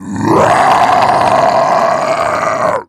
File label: zombie coming; zombie plague